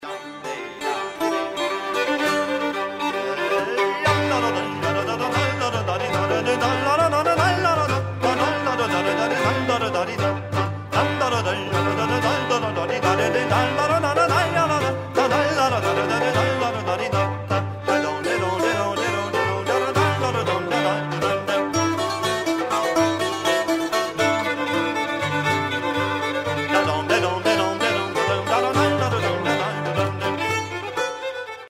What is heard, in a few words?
jig